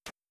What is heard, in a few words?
jump; cave story